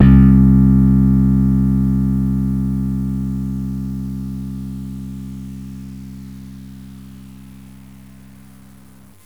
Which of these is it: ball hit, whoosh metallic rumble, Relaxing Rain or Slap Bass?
Slap Bass